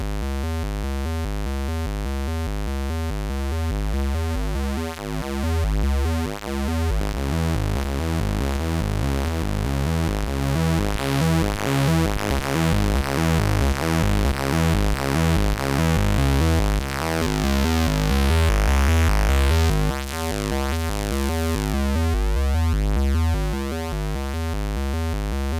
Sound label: Stacked Voice, pokey